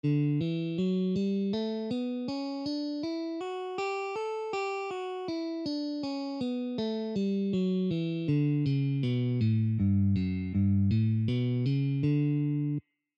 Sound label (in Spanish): escala de Re mayor posición 5